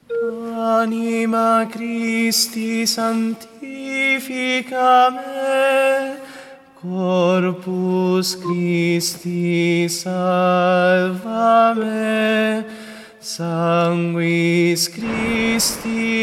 Anima Christi Tenori; ufficio liturgico; ARCIDIOCESI DI SANT’ANGELO DEI LOMBARDI-CONZA-NUSCO-BISACCIA

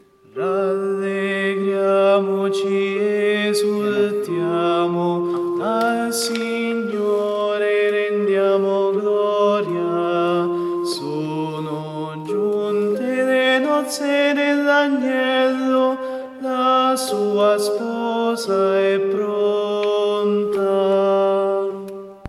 Rallegriamoci tenori; ARCIDIOCESI DI SANT’ANGELO DEI LOMBARDI-CONZA-NUSCO-BISACCIA

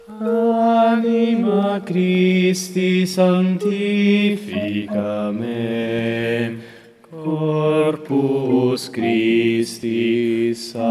Anima Christi Bassi; ARCIDIOCESI DI SANT’ANGELO DEI LOMBARDI-CONZA-NUSCO-BISACCIA

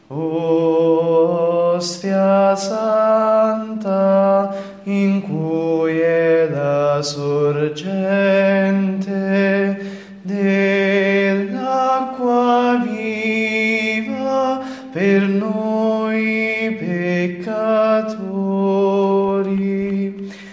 tenori; O Ostia Santa

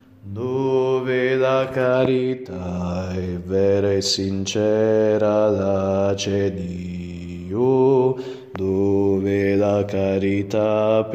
bassi

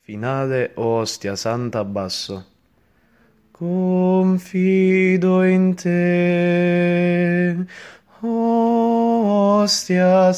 O Ostia Santa FINE BASSI; ARCIDIOCESI DI SANT’ANGELO DEI LOMBARDI-CONZA-NUSCO-BISACCIA

